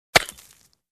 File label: Smashing rock with a pickaxe